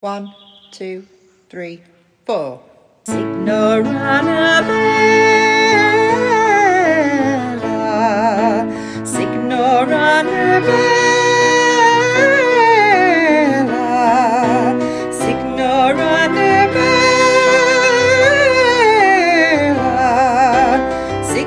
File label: Backing